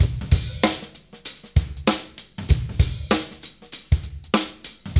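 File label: 96 bpm, Funky Drums; AMG; Now CD-ROM (Roland); Neil Conti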